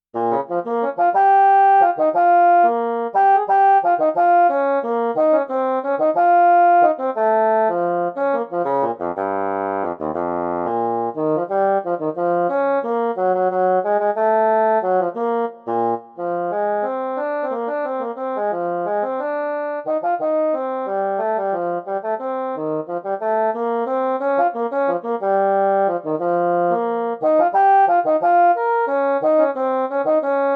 Bassoon Technical

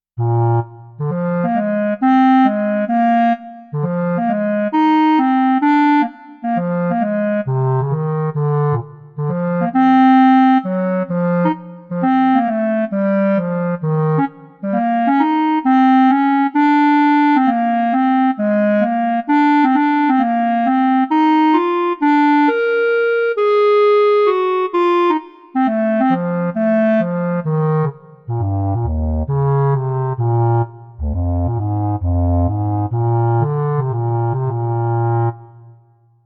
Bass Clarinet Technical

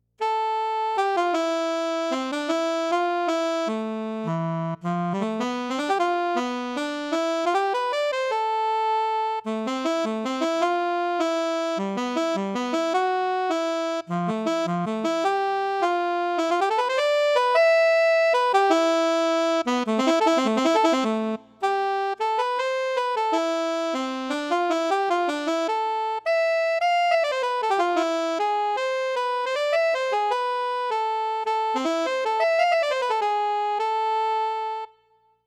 Alto Saxophone Technical

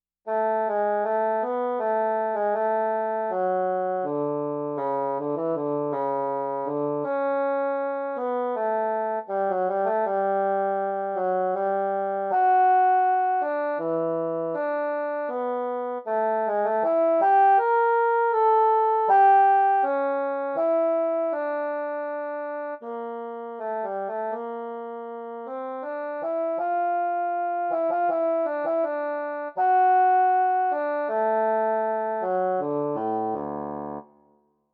Bassoon Lyrical

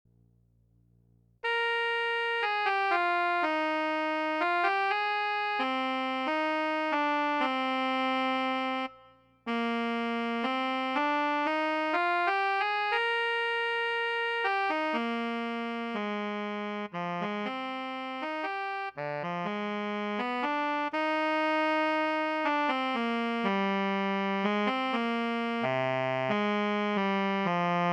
Tenor Saxophone Lyrical

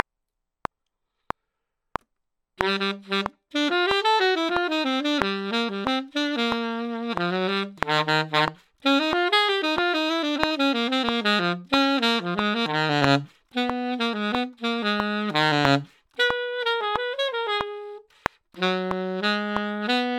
Gunk Funk Alto

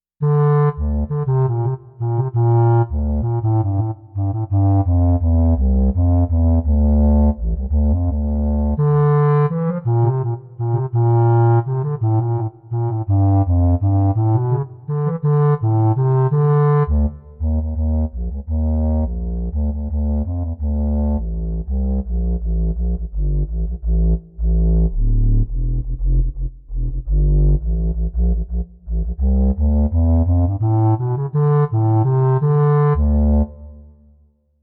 Contra Clarinet Technical

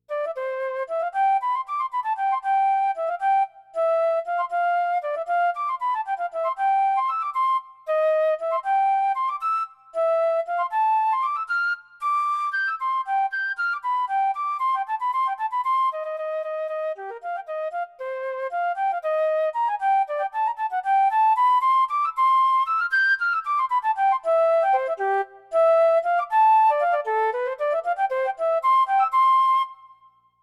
Flute Technical

